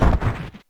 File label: Trip Fall; Wii